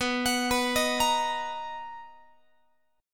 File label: B Major 7th B down Guitar; arpeggiated